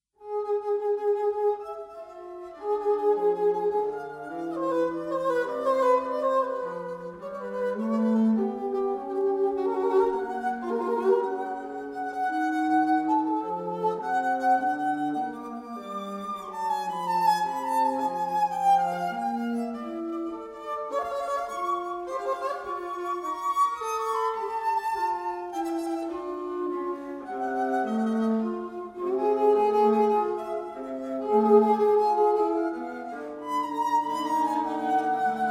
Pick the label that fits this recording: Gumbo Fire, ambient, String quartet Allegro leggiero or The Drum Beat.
String quartet Allegro leggiero